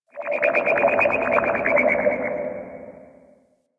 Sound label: Corpus Ship Tech Chirps; Ambience; Warframe; Corpus Ship Remaster